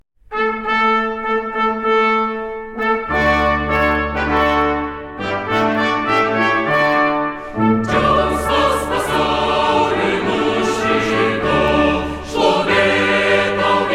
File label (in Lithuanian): misrus choras; Kauno misrus choras 'Kamertonas'; Kaledu ankstu ryta (2008)